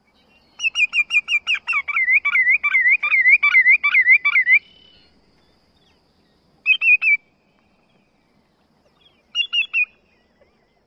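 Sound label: Greater Yellowlegs, song and tu tu tu calls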